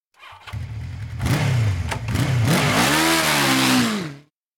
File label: bike rev; anemoi